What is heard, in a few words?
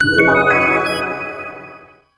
MUSIC STONE YES